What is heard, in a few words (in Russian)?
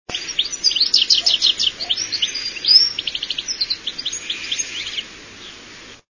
schegol